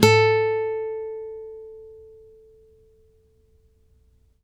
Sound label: ACUSTC A 3, ACOUSTIC guitar; East West; Steve Stevens Guitar Samples Collection; ACOUSTIC PCK